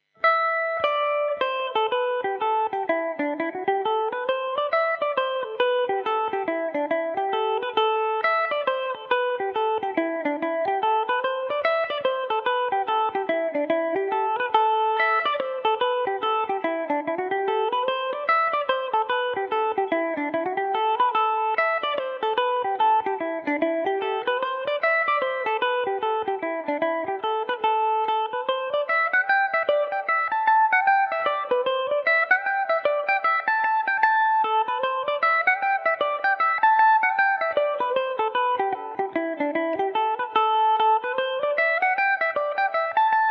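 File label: A dorian revelation, irish mandolin; The Old Torn Petticoat; Reel (4/4), A Dorian; Revelation Jaguar electric mandolin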